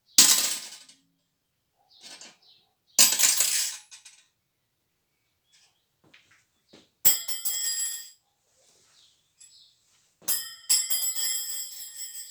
Bruitages metal chutes objet

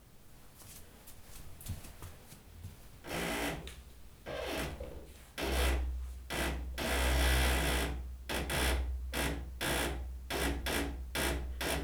Improvisation sur Porte qui grince; Bruitages

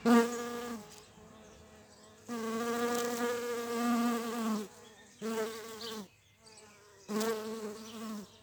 Abeille; Vols Bourdn abeilles

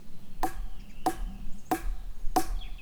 Baton creu Tocs Graves; Percu Bois

